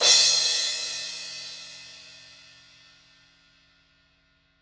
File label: Crash A Medium 17 High Vol